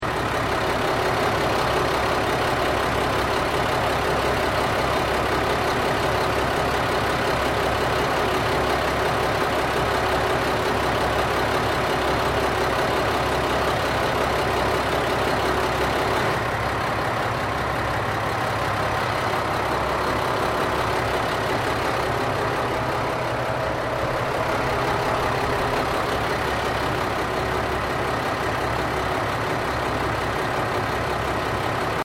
Train Aomori DC40 Passenger Diesal Idle Close Loop, Japanese Trains; Vehicles